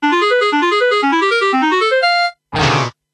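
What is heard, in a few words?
Se meteorite; Kairobotica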